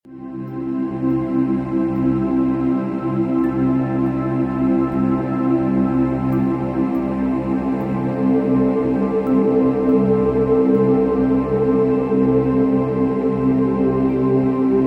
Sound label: Ambient Soundscapes - Tranquility